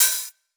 house hihat open

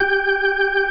HAMFAST G4

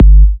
Minimal Kick, Drums, Kicks

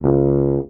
TUBA STRA C#1, Tuba Straight; Orchestral; DirectWave; Varazdin Orchestral

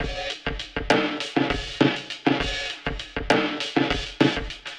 100 Slow Tape, Warped Live Drums; Loops; Touch Loops; CosmicFunk

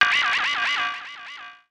FX SHOT TEKNO 140; Techno2; Singleshots

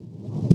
One Shot, Hits

